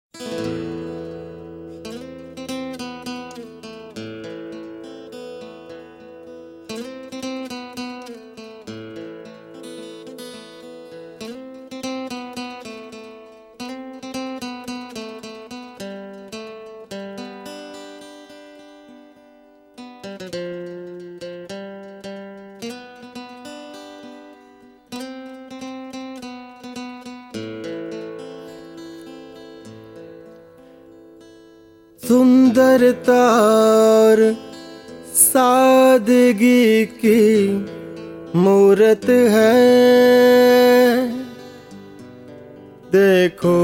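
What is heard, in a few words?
Sad, Hindi song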